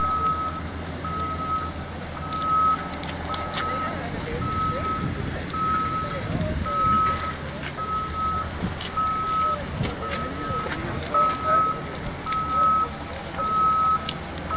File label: riverside transport museum construction site glasgow